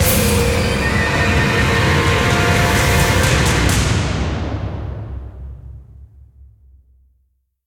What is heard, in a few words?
stinger